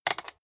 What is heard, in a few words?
rotary control short quick turn hard